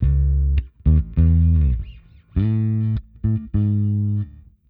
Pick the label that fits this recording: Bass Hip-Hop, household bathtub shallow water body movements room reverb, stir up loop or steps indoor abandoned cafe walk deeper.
Bass Hip-Hop